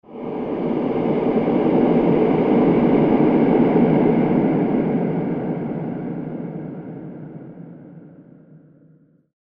doom breath low; mystik

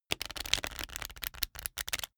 pack of cards shuffle table

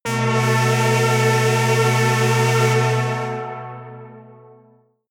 synthesized saw drone high

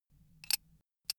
bullet handle click; krieg-waffen; geschoss

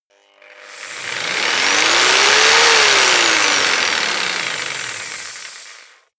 jigsaw engine medium fast medium length; maschinen; saege

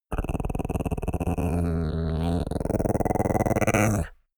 chihuahua growl heavy; natur-tiere; hunde

